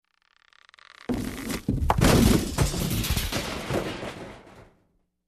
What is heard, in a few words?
Bowling Ball Smacked a Rack Sound